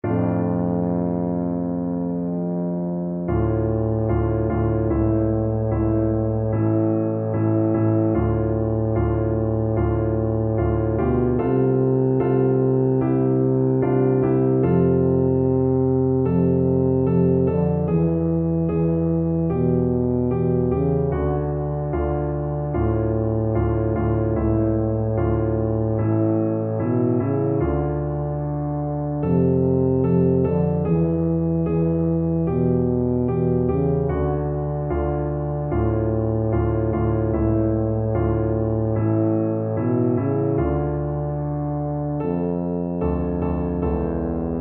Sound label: beethoven 7 2nd, tuba